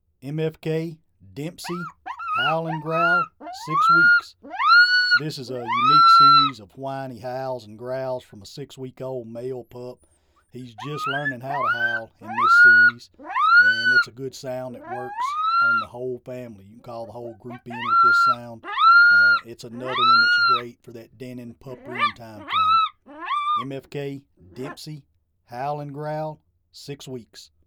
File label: Howl n Growl 6wks, Game Calls; Dempsey